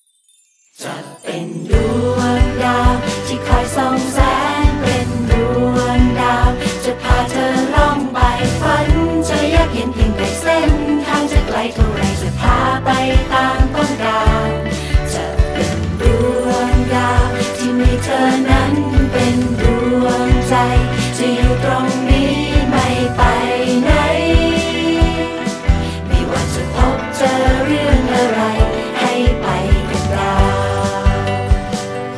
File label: Ringtone